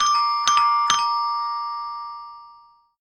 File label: ding dong bell will ring; salamisound